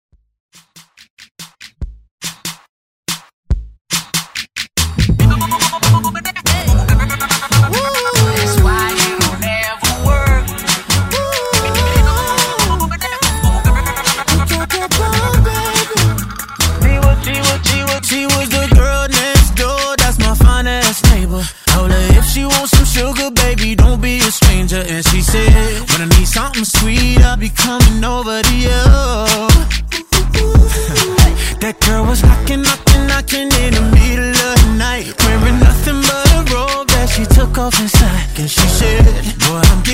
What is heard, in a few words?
Pop Redrum